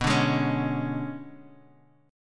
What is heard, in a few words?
B-Minor 6th Add 9th B down Guitar Half Step Down